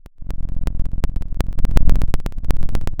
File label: pop, Sound of a fire crackling